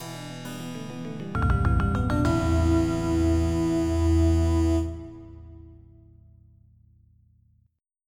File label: game over; Flashback Demo